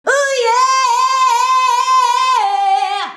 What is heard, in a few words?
"UYEAHYEAH", FEMALES; Best Service; Hyper Dance